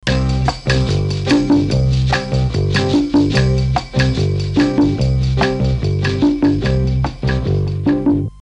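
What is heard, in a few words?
m300 bossa, Mellotron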